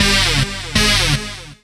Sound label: Calm Fake; Jungle; Blu Mar Ten - Jungle Jungle - 1989 to 1999 Samplepack